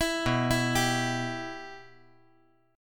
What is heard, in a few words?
B Suspended 4th Sharp 5th B